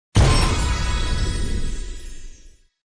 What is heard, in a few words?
vip Upgrade